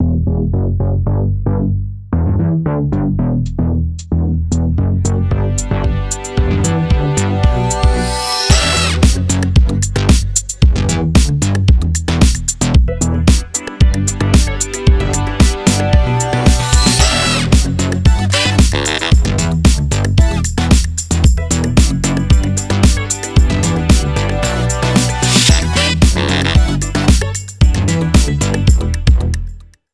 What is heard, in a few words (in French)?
INSTRU; FETE RADIO 30 SEC